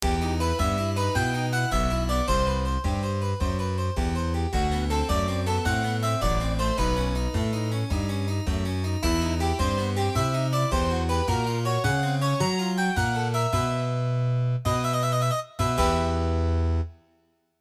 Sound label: ringtone bach